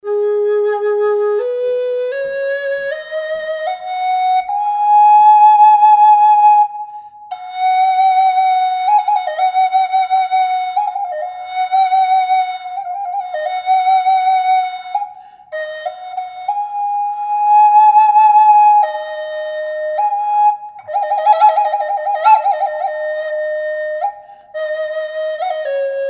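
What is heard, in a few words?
reverb, flute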